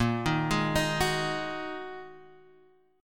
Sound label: A sharp 7th Suspended 4th Sharp 5th down Guitar Half Step Down